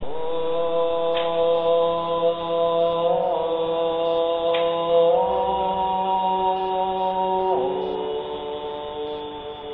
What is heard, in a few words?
shaku